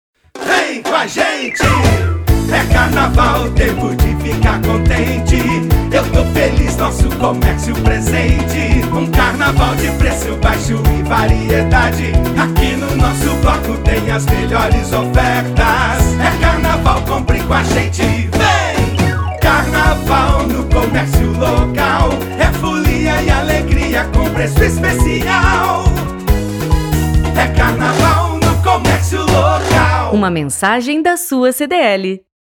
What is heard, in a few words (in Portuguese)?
JINGLE ESPECIAL CARNAVAL